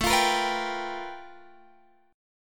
G 7th Sharp 9th A chord; G7#9

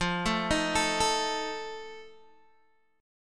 F 7th F down Guitar Open D

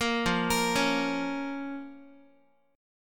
F Suspended 4th Sharp 5th, down, Guitar; arpeggiated; 13,13,13,11,x,x; JGuitar

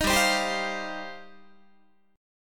G 7th Suspended 4th Sharp 5th, Guitar

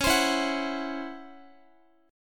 C sharp Minor Major 11th C sharp Guitar; C#mM11 strummed; x,x,13,17,16,14; JGuitar

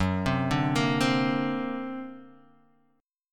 D Augmented 7th F sharp, guitar

